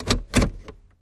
Car Auto Shifter, shift clicks

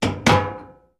Switches, Industrial

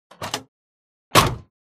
Car Doo OC Compact, doors

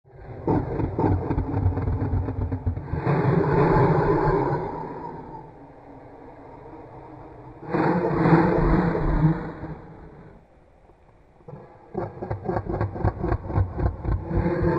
Monster Laugh Eerie

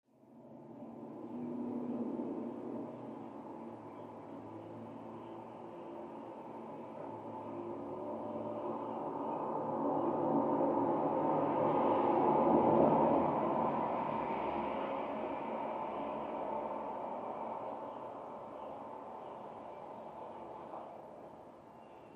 Car; Light Bys On Street, Through Closed Window.

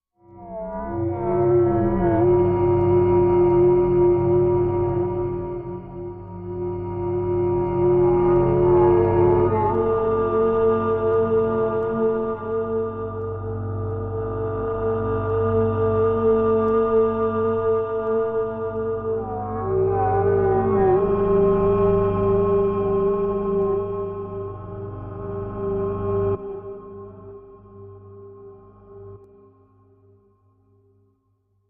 Backing Mystic Alien Ha, eerie ambience

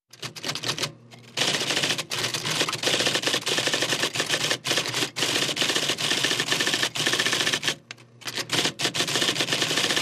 Modern Electric Typewriter; typewriters electric

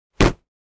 Impact Hard, rocks & dirt

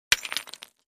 Brick Hit Hammer

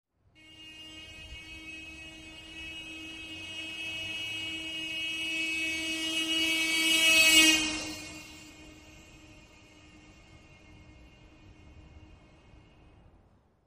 Car Horn Long Doppler, american

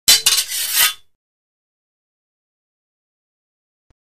Swords Metal Clang; clangs

